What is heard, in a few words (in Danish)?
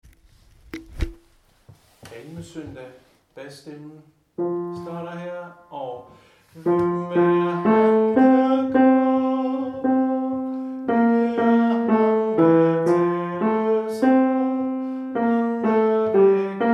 baryton; Palmesøndag; harlev koret